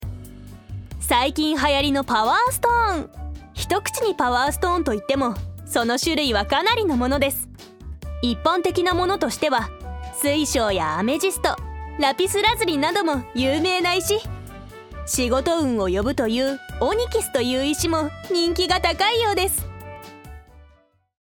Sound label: BGM